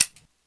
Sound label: button rollover